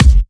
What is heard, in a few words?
Bassdrum; Vengeance Samples